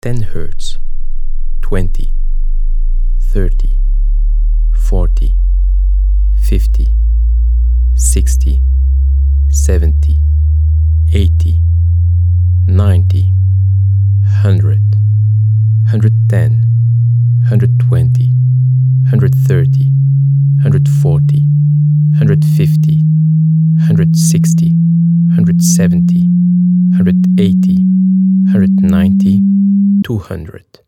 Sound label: frequency check low; Headphone Bass extension